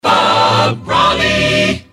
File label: jingles resings jock cut accapella; pams